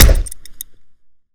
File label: wrench hit; weapons; melee